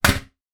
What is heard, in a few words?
toilet seat plastic down; household